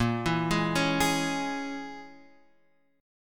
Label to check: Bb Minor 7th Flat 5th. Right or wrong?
Right